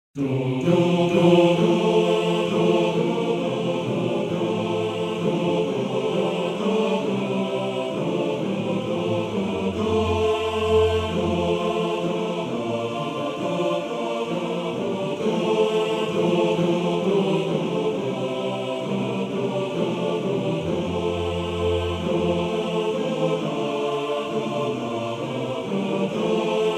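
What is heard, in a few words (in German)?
Chor, Männerchor; Heimetvogel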